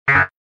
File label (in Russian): Звук ошибка отказано